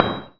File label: Hit